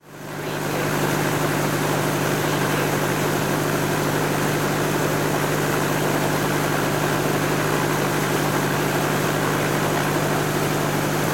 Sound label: Helicopter, Idle, Exterior